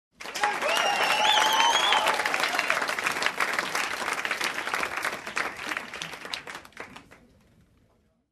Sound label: Sitcom Applause